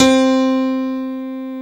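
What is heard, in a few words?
Roland Electrics Grands piano; Club-50 - Foundations Roland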